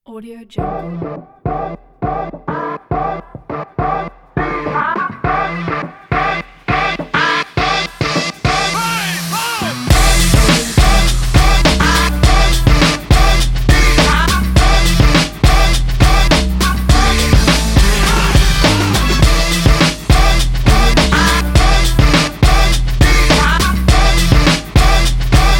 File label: hip hop